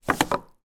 household swimming pool filter net down on wooden decking